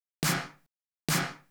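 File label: SNARE ELECTRO 140, Techno Drum; Techno2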